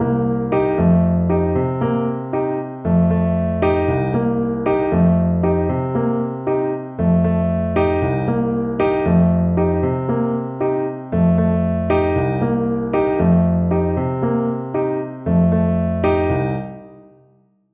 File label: Latin groove